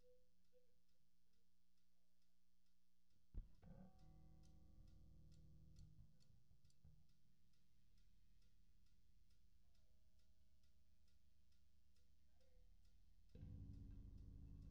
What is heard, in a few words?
Bass; Pro Tools